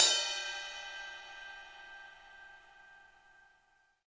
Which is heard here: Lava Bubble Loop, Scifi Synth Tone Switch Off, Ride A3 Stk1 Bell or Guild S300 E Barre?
Ride A3 Stk1 Bell